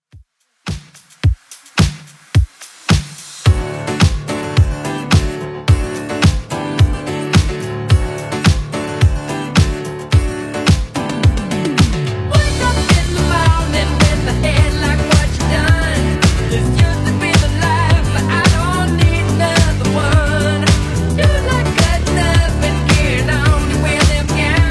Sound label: 20s Pop Retro Redrum 108 bpm